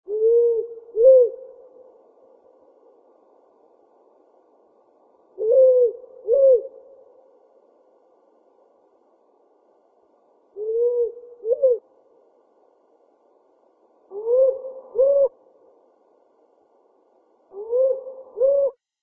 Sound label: Powerful Owl call